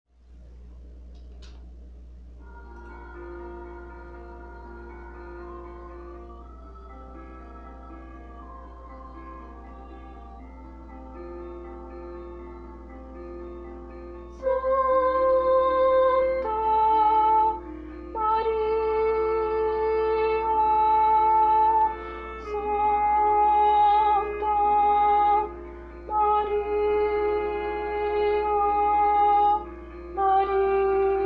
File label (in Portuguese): contralto; ave maria gounod